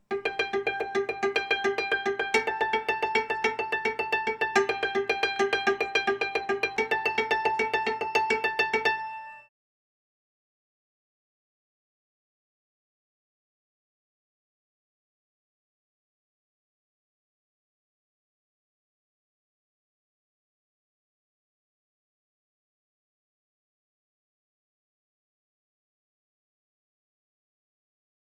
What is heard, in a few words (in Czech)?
Cimbal